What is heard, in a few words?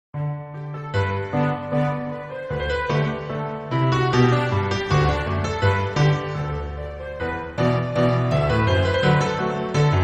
Theme Orchestral Arrangement